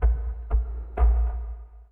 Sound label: bottle, ethno, 125